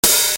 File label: 505 OPEN HH; Drums